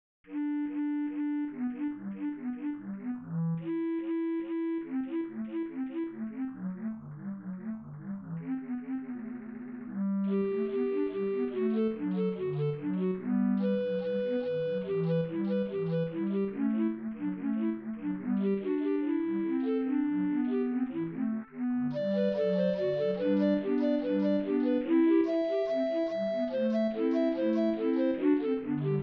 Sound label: Bach Fuga synth